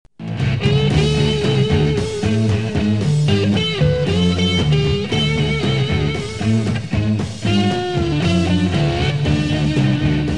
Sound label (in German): e-gitarre